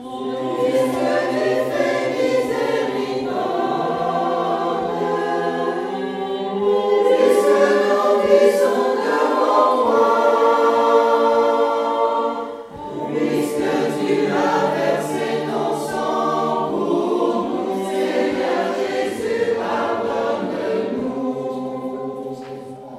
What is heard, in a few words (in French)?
Tutti; Puisque tu fais misericorde